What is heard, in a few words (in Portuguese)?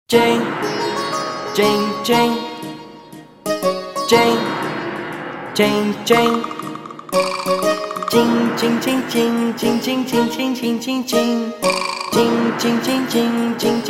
CHINES